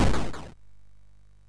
sid MOS6581R3; hvsc

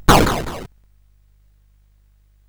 sid CSG8580R5; hvsc

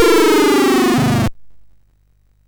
sid MOS6581R2; hvsc

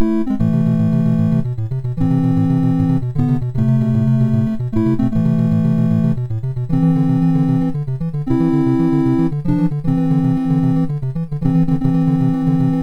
sid MOS6581R4; Ikari Warriors USA Version; hvsc

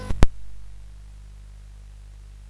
sid CSG8580R5; Gryzor; hvsc

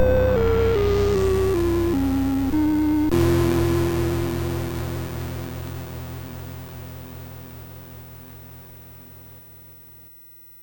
sid MOS6581R3; Attack of the PETSCII Robots; hvsc

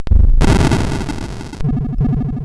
sid, 8580; Die Alien Slime; CSG8580R5

